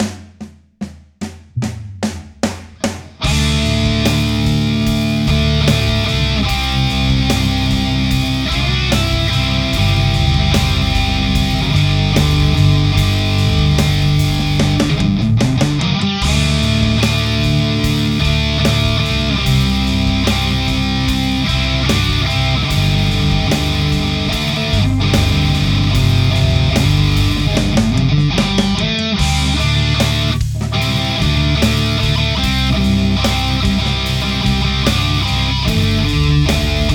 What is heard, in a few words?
148BPM D minor; song in a hat 2025